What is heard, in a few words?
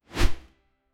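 fantasy sound of a bowstring, archery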